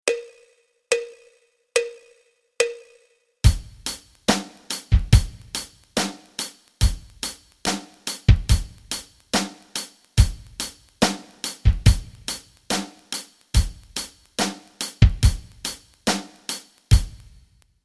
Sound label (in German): rock rhythmen